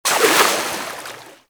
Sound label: Water Sprayer Shot; Warframe; Weapons